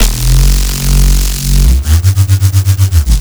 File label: Sound FX Dirt F sharp